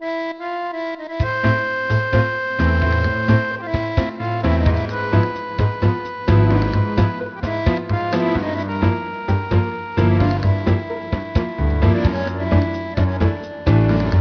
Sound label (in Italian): tango